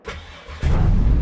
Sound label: startup, vehicles; chev suburban